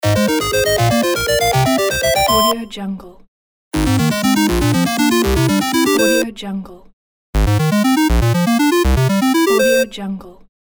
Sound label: 8bit win